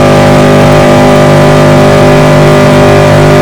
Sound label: camaro on high